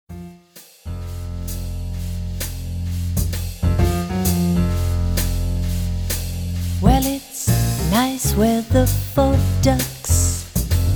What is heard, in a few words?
quartet vocal